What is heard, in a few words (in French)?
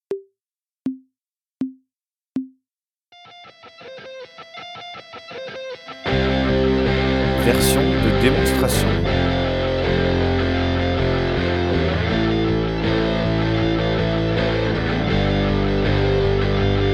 playalong sans batterie